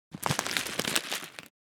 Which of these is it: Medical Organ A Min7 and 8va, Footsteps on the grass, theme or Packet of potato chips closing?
Packet of potato chips closing